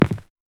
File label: footsteps walking on soli